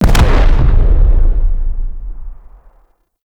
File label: Enemy Ship Destroyed Explosion; Warframe; RailJack